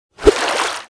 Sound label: FISHING STAND